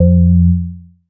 bass classic nice F; Polyend; ARC Noise

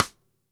DW Green Maple Cross Stick; Sonic Foundry (Sony Creative Software); Vital Drums

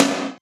90s Gated Snare, Alesis Hr16b, Drums

